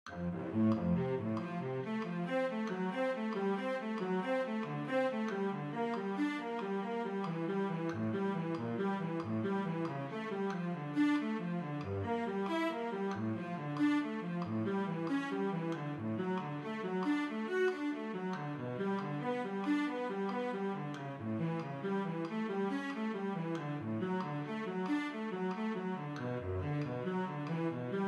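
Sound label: Cello, Orchestra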